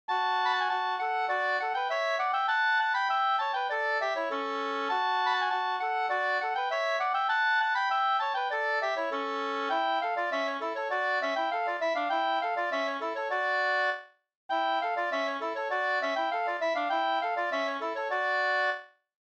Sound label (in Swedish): halling; fr norra Bh Dybeck Runa1845SLnr8 2st